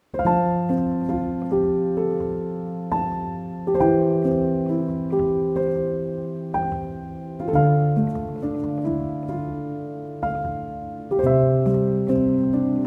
Piano Version; Whisper of the Heart

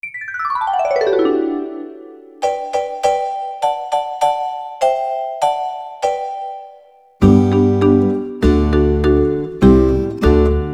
Instrumental

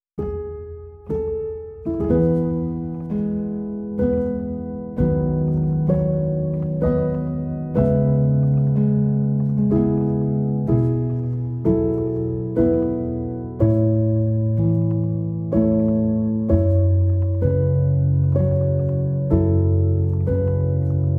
Sleepy Version, Piano Version; Grave Of The Fireflies

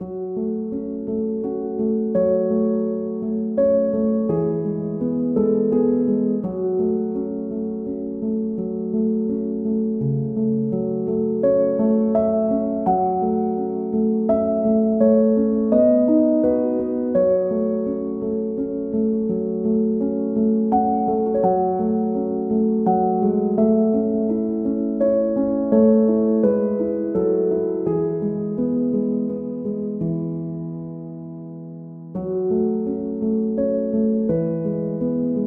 Scarborough Fair (Piano)

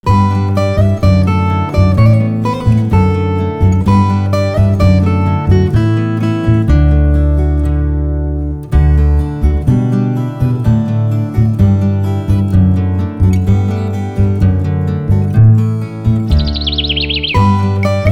Instrumental

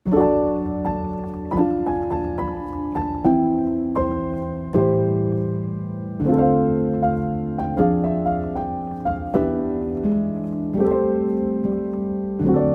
Piano; My Neighbor Totoro

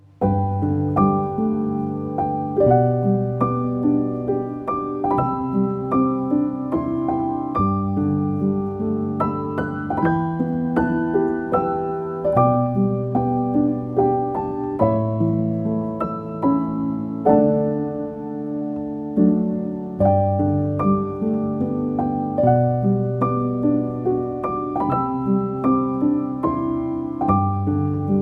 Piano; Spirited Away

